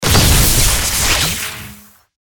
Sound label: Match Release Attack; Godzilla Smash3